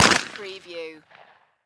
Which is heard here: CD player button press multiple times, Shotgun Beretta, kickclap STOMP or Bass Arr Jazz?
Shotgun Beretta